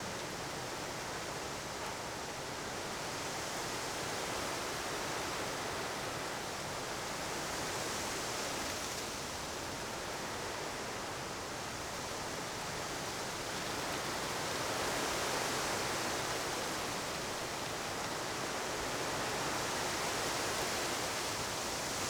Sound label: tree wind; gmod; garrysmod; airfield